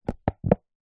foley plastic tub empty ice cream container bend warp